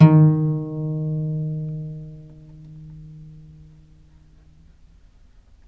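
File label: VIOLE PIZZICATO D#1, Historical Instruments; USB Soundscan vol.23; AKAI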